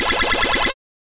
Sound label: calculating points